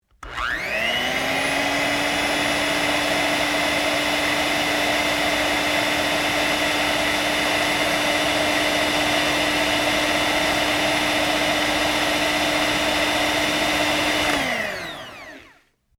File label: hand mixer